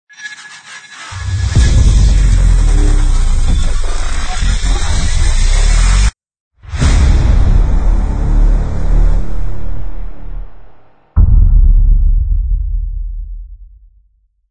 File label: Transform Logo